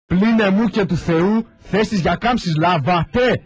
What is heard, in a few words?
military